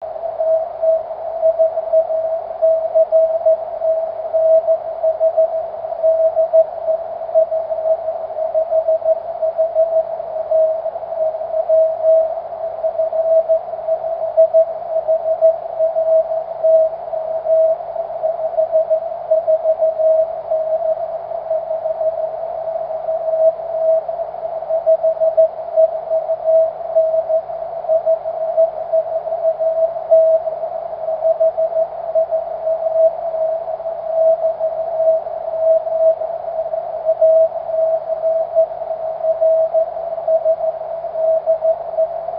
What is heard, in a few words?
cw, 23cm; f5hry